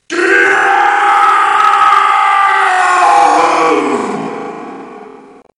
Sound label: death long scream; superheros; the hulk